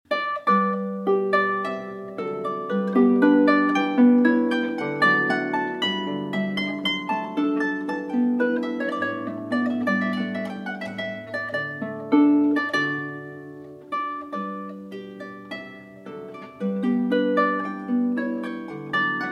Suite in G Major, Almand (Arr. For Harp)

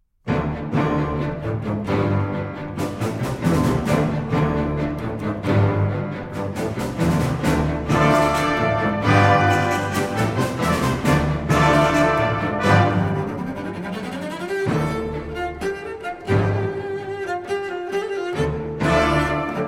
Cello and Orchestra

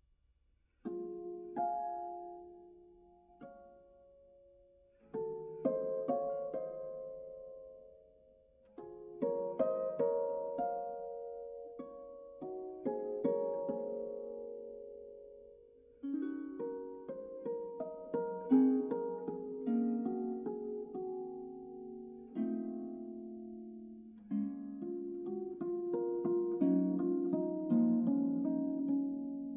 Arr. For Harp; Suite Bergamasque, L. 75 No. 3, Clair de Lune

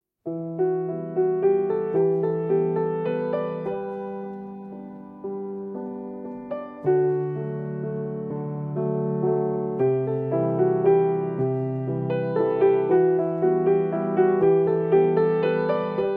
Arr. Piano; A Time of Wonder

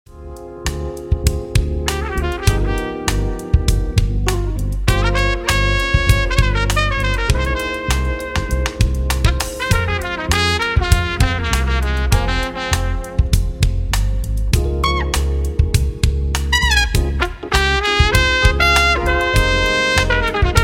Smooth Trumpet Jazz

